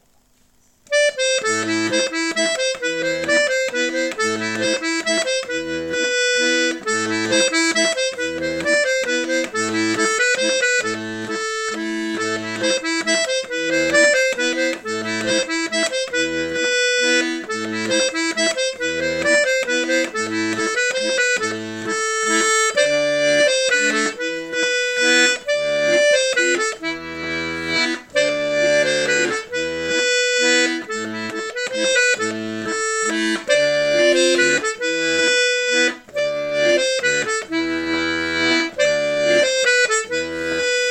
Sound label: mazurka